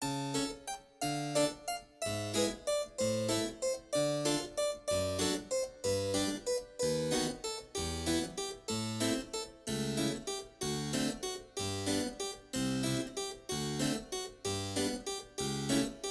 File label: Gloria impro